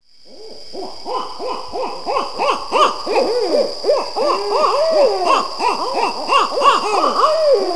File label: barred courtship, owls; myowls